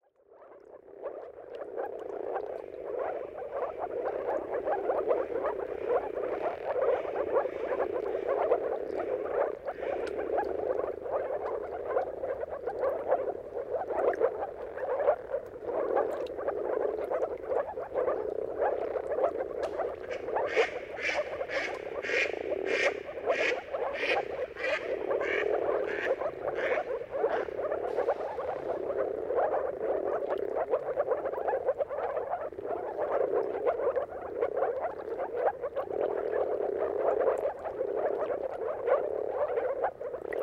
Dawn’s Chorus: Mating Calls of Marsh Frogs at Sunrise; Jakub Orzecki; moor frogs; Wind Is the Original Radio